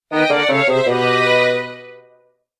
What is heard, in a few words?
Dungeon Failed